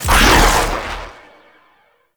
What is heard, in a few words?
Whirlwind Dash Cast; Warframe; Warframes; Caliban